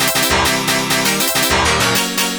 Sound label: piano sequence; 'Go Fuck Yourself' samples; lolicore